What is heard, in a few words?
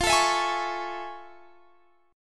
A Major 7th F; AM7/F; strummed